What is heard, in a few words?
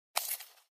Button Spring Rustli